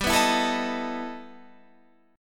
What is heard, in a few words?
E Minor Major 11th G, guitar